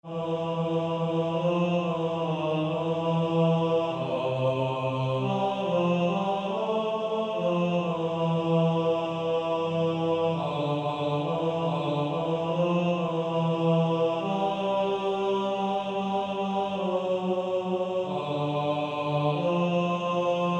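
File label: Male Choir, tenor 2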